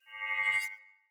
Long Sword Whoosh Accent Heavy; Weapons; Player; Swords; Pothia

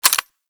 Rifle Mech Secondary C; Tenno; Bard

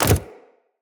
Companion Land Default, Footsteps; Pets; Zanuka

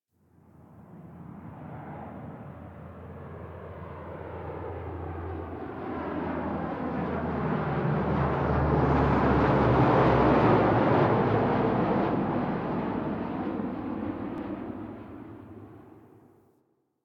Distant Ambient Fighter Jet; Warframe; Ambience; Nineteen Ninety Nine

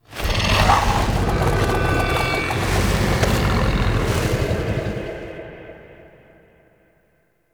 Idle Noble Throwing; Warframe; Voruna; Idles Noble

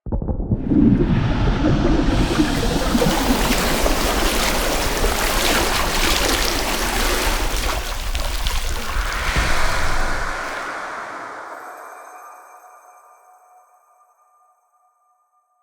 Blacksmith Station Reveal; Soulplane

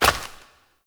Step Flesh; Warframe; Koumei

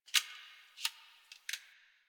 Computer Room Floppy Disc

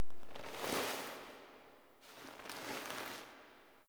Foley Turn Head; Warframe; Cinematics; TheWarWithin; TWWQueensLair

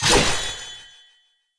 Saw Dagger Throw Perfect, Weapons Swords; Player

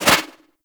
Turbulence Deflect; Warframe; Tengu

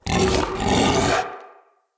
Battle Dog Attack Bite Double; Animals; Vocals; Snc Battle Dog

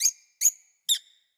Forest Open Glade Bird Close, Day

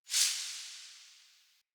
Talisman Spirit Burst; Cosmetics; Founders Talisman

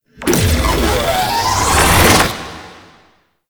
Drop Beam Charge Up; Enemies; Weapons; Warframe; Tau; Sentient Dactolyst

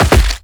Land Rock, Footsteps; Characters; Warframe; The New War; Kahl

